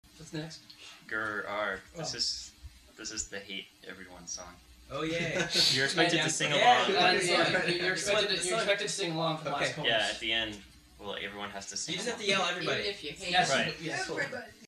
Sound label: intro live